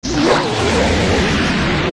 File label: Parasitic Tentacle Die; OOT